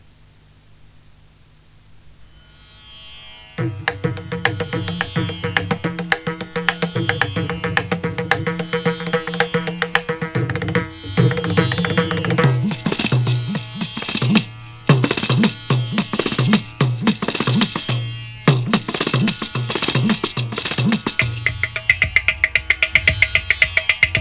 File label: PERCUSSION ENSEMBLE INDIA